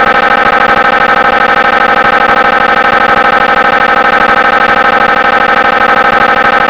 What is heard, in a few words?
digital